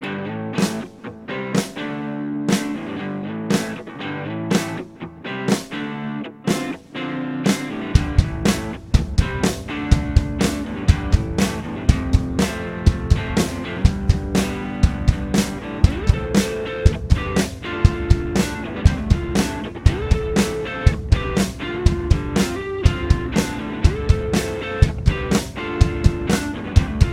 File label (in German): playback schnell tempo 121 mit schlagzeug